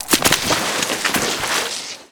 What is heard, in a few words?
sewer slide; Modern Warfare